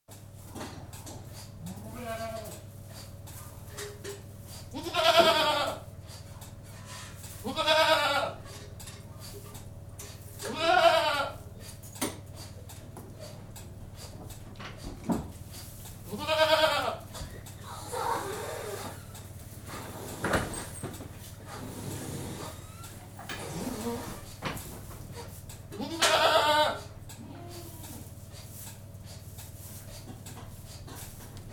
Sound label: Goat House, interior, general atmosphere with milking machine in background, suction of machine onto teats; Farm Sound Effects; suction of machine onto teats at 0m17s; BBC